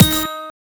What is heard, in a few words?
stop bonus; Amatic; Fruit Box